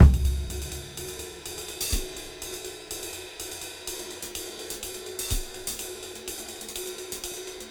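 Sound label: Disk 125 BPM Cymbal; AMG - Keith LeBlanc Phat Butt Jams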